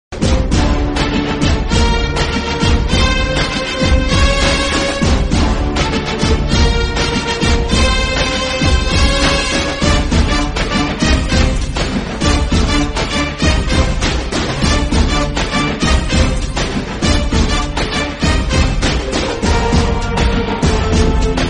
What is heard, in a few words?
Bgm Ringtone; Elumalai